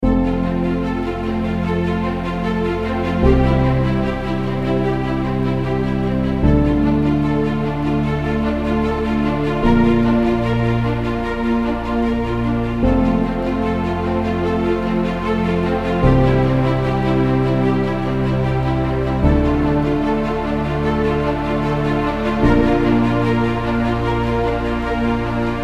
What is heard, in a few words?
Atmospheric Inspiration